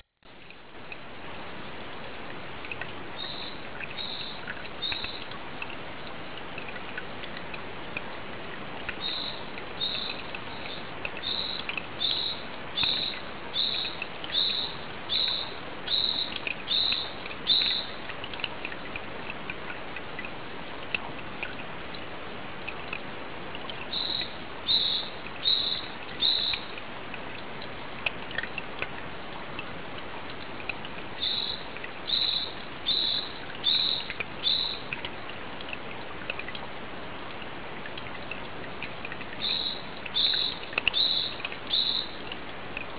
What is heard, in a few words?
spring; Sensorium; kawasaki